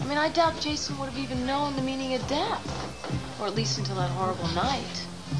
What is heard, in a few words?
death